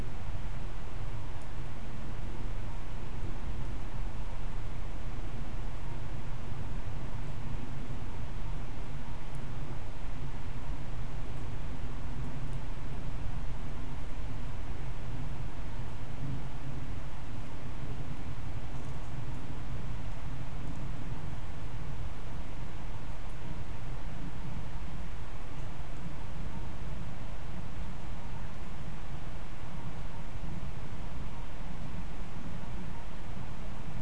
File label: no obvious biophony